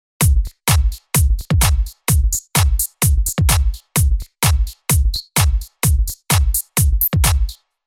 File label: house mix